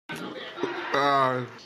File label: aahhh